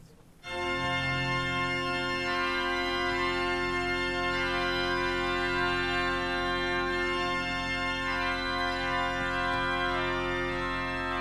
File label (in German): Orgelvorspiel; St. Aegidien